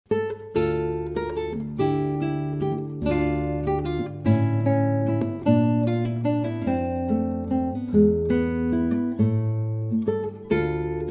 Gigue in D minor

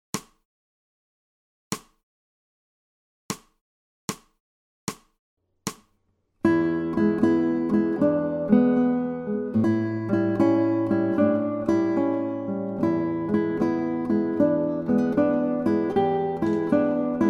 Shuffle Blues

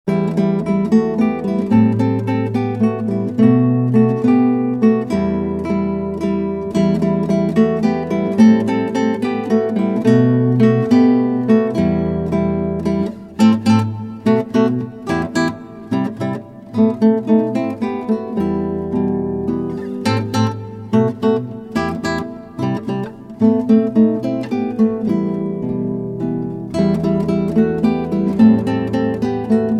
Latin Duets Carnavalito, Gitaarboek

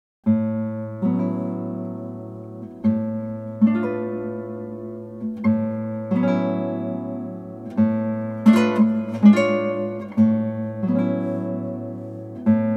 Guitar Recital; Gitaarboek; Gymnopedie

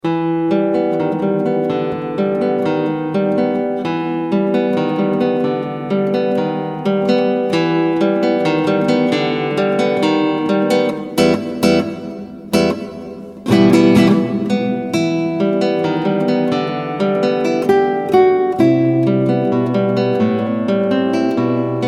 Guitarra Tirando Salsa, Gitaarboek

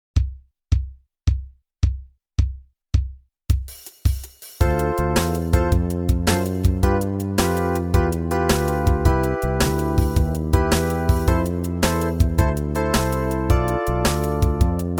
Guitar; Gitaarboek; Go for Guitar

